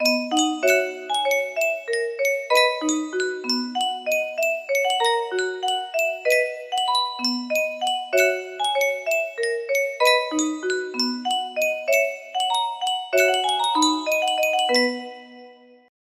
music box, music box melody; swiss jodel

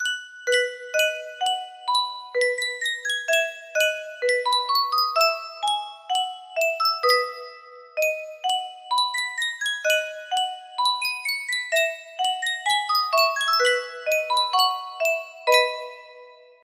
Sankyo music box

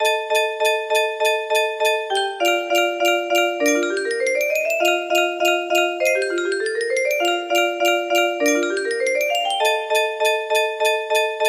30 note, music box melody

